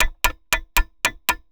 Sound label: indicator; soundscrate